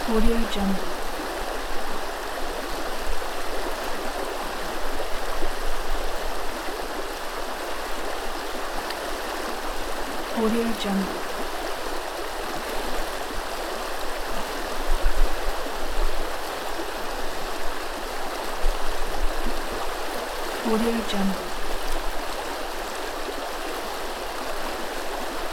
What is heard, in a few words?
river water flow